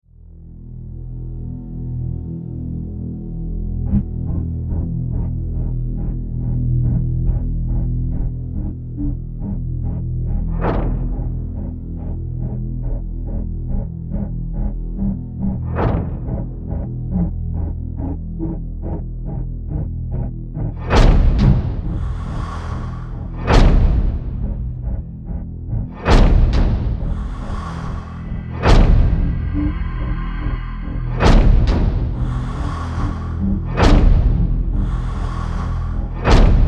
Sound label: drums; brand x music; crusher